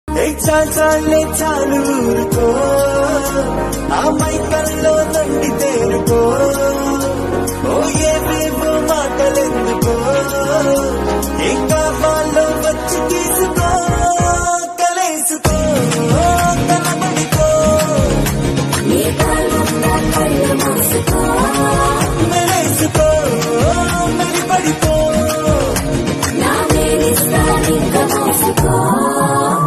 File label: Telugu ringtone